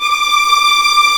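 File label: D6 tremolo f violin left, violins tremolo; East West; The Ultimate String Collection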